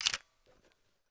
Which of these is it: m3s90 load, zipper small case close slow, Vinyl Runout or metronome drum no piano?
m3s90 load